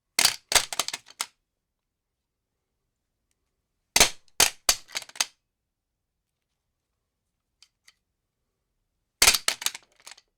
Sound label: gun drop on cement